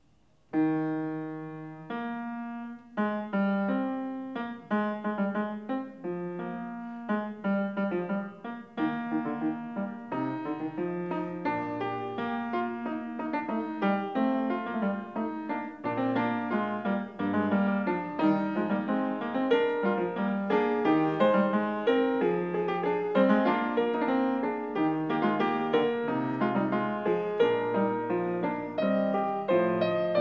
Fugue No. 7 in E-flat major, Bach, Well-Tempered Clavier; BWV876